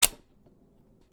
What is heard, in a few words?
move; sliding puzzle7